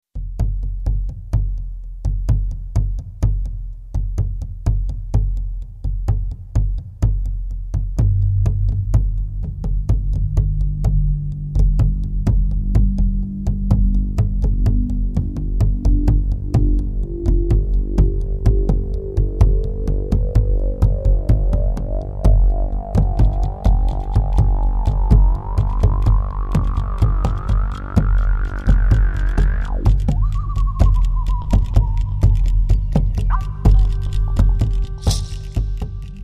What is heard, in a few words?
drum